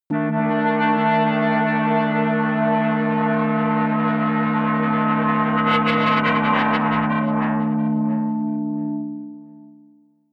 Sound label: atmospheric feedback synthesizer long high, drones; musikelemente